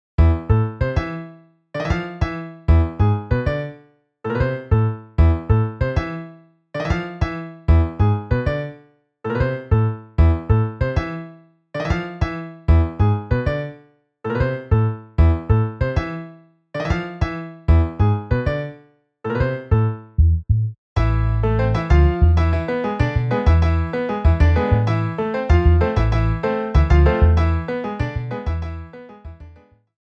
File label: Piano Bass MIDI